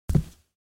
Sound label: Socks single step on wooden surface